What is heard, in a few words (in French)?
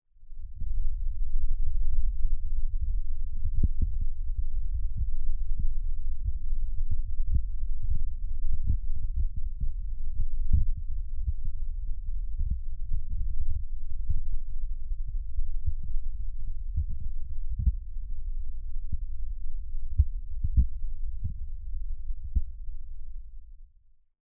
Arbre Contact Fourmi Homme, Friche Industrielle Rhinau